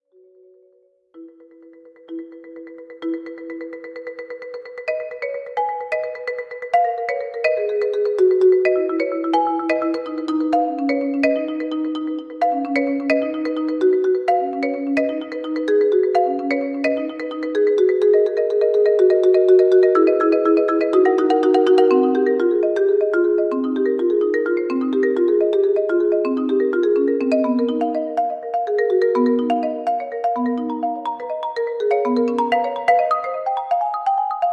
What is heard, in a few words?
solo 4-mallet marimba; C. Alan Publications